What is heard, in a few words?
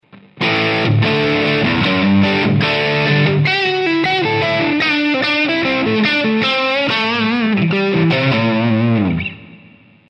solo